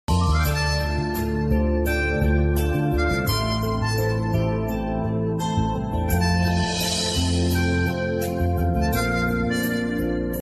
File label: violin bgm; Arya movie; Allu Arjun